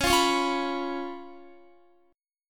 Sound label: Db 7th Db down Guitar; Db7 strummed; tuning=4F,4A,5C,5G,5C,6E